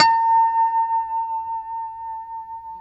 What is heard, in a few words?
B5 pick harmonics, guitars; Spectrasonics; Hans Zimmer Guitars VOL-1; JC-HARMONICS